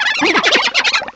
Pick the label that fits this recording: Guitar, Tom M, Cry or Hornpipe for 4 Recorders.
Cry